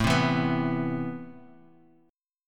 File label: A-Minor Add 9th A; strummed; JGuitar